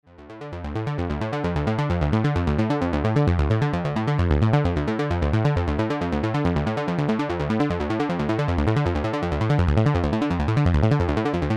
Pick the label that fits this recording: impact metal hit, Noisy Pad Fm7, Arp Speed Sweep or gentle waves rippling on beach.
Arp Speed Sweep